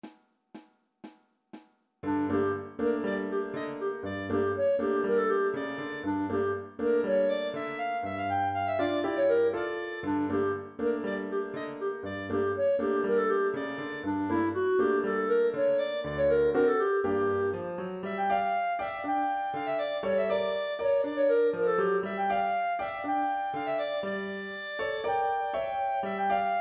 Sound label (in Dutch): Freylechs from Warsaw met klarinet